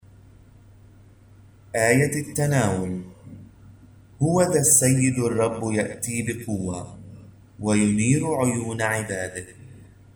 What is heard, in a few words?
Communion Antiphon; 2 Wednesday of Advent